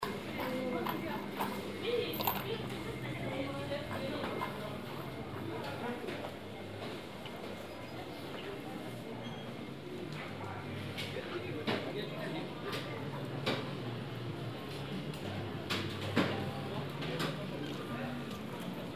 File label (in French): ambiance